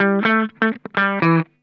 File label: Funk Guitar; FRONT; Zero G - Funk Guitar